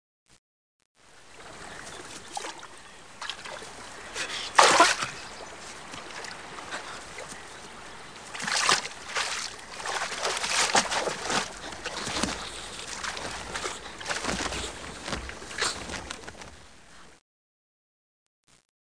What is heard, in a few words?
WATER; Sound Library 1